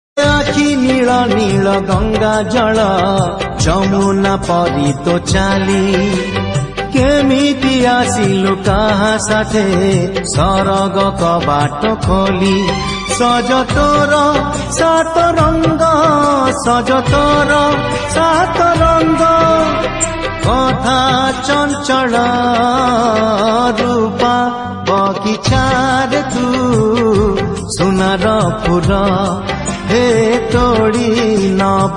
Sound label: Odia Song Ringtone